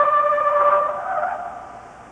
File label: Ghost Pop Trumpet D4